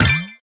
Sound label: collect; pinball